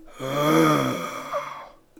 zombie alert

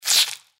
mantis hiss; npc

